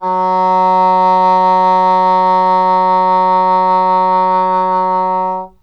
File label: English Horn F#2; Standard Instr